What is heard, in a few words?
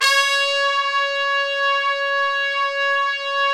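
brass trumpets section; Roland LCDP06 Brass Sections; Tpts Sec.2-3; Tps Sec.3 f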